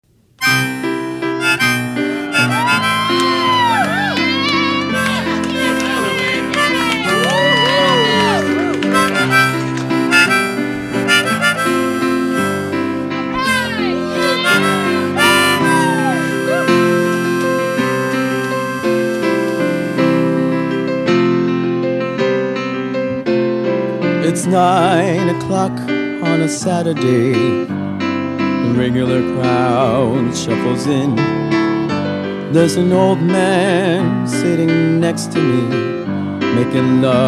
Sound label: slow singalong